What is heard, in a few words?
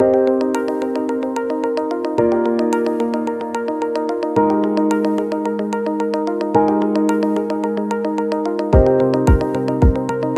Medicine Commercial, Corporate and medical background music